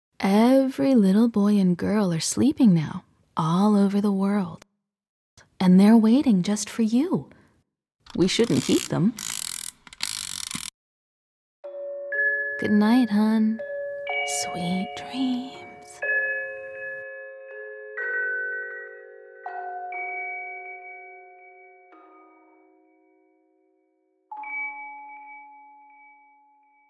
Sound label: Imagination piece Musicbox; Among the Sleep